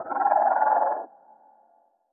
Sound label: chirp, protozoan; hl1 npc